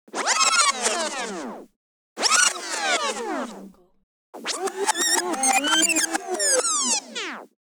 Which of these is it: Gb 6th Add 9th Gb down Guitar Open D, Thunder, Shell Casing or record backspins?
record backspins